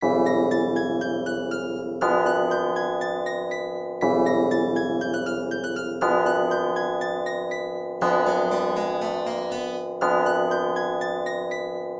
Creepy Clown Loop

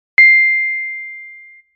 Idea Sound Effect

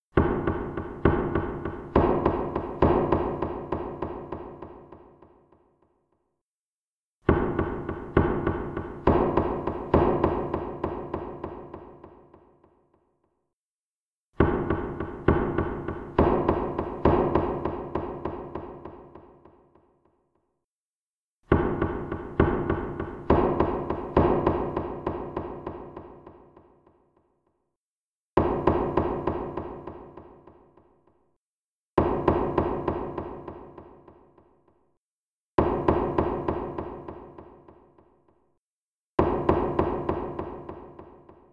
Dramatic Suspense Horror Sound Effect

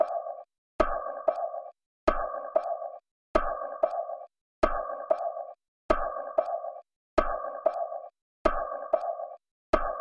Reaction Piing

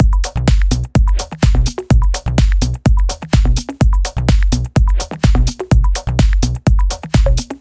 Tech House Loop

